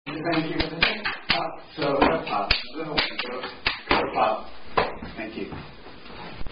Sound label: Down By The Banks, Clapping Game